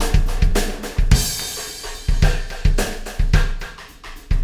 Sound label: System Overload Beat, Processed Drumkits